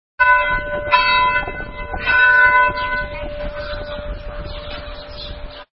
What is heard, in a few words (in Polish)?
dzwon